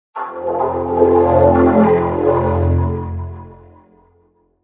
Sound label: Windows XP Startup Sound in Electostacics, Vocoder